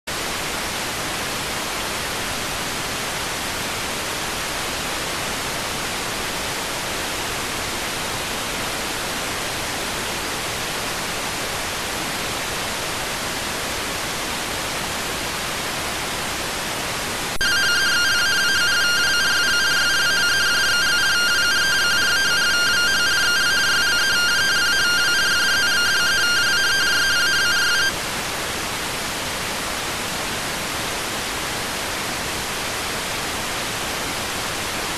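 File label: radioteletype receive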